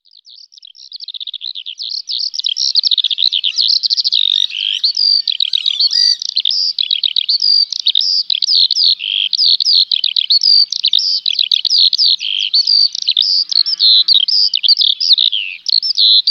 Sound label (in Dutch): veldleeuwerik